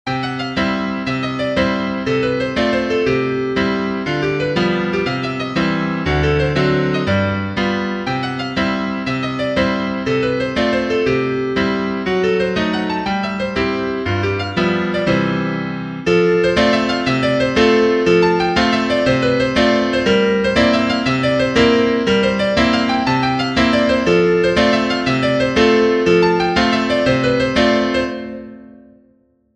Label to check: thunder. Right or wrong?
Wrong